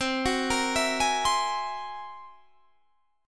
F sharp 9th Flat 5th, C

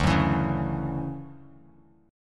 D-Major 9th D down Bass Standard; DM9; strummed; JGuitar

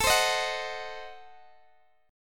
A Diminished 7th A down Guitar DADGAD

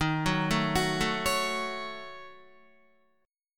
D sharp Minor 7th Flat 5th, Guitar, D#m7b5 chord; arpeggiated

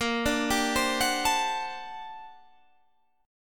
G Minor Major 11th, Bb

